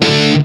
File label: METAL POWER; East West; Global Sounds